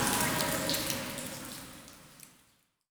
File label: Tunnel drips